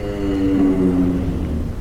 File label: call, giraffe; zoochosis pack1